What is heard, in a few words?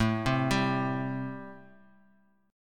A-Minor 7th A; x,12,10,12; Am7 arpeggiated; JGuitar